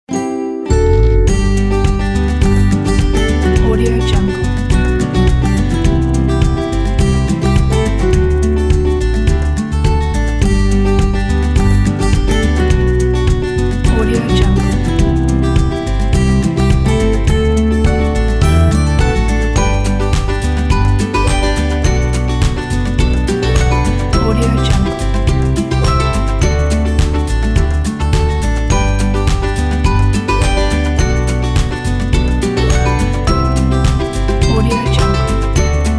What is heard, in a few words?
positive folk